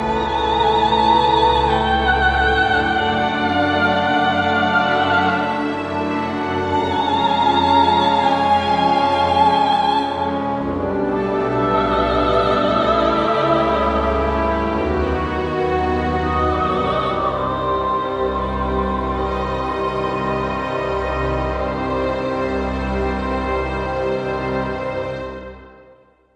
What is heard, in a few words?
Orchestral Plenitude